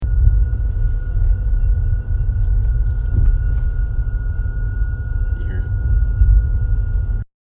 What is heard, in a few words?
rlx ac noise; third generation rlx 2013